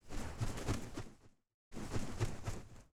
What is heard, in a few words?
Harpy Wing Movement